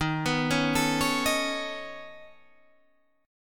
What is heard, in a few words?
C sharp 7th Suspended 2nd Sharp 5th D sharp